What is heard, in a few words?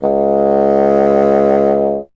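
wind bassoon C#0; Miroslav Vitous - Solo Instruments VOL-1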